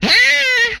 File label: Inhuman Death, Vocal; retro arcade; MMRetroArcadeSoundsPack